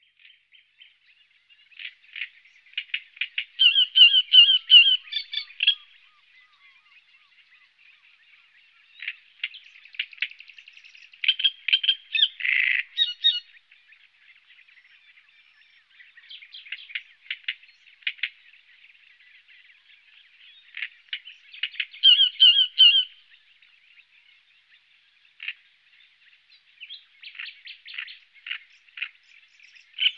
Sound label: Great reed Warbler